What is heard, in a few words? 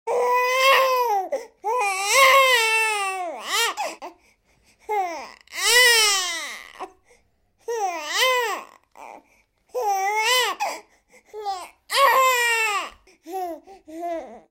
Infant Baby Cry